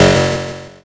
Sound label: zap